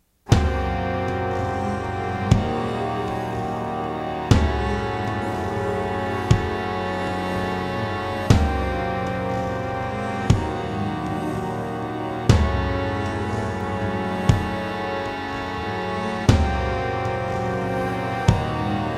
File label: Dirge In F# Minor